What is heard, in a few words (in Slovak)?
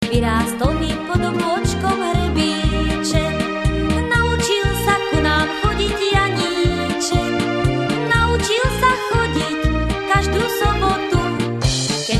akord